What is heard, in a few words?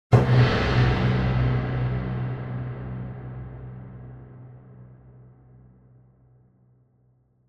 Gong Symphonic Gong Hit; Hollywood Edge; sound designer's toolkit v2